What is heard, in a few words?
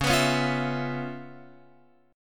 Db 7th Sharp 9th Db down Guitar Open F; Db7#9 strummed